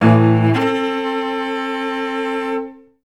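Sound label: cellos A2; Best Service; Peter Siedlaczek's Advanced Orchestra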